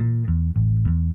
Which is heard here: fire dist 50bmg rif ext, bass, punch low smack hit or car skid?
bass